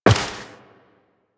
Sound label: Step Metal, Footsteps Walk; Warframe; Tenno